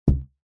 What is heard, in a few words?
rubber band large ping twang thud; foley; zapsplat